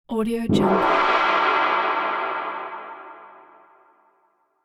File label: gong cartoon